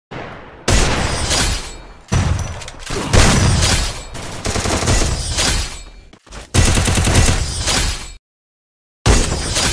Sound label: Gun, Free Fire; بازی فری فایر